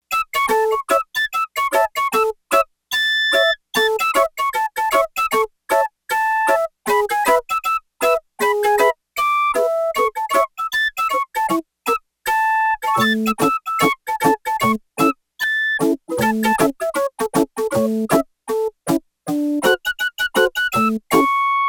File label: PuffPipes, Yamaha TX802; Factory Patches; Voices